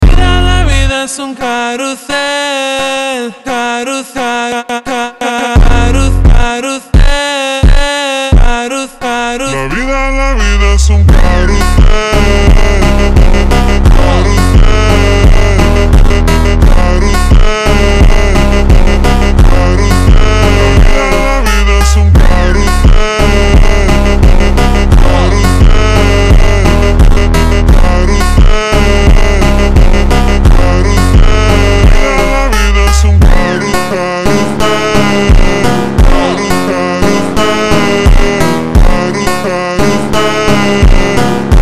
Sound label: Ultra Slowed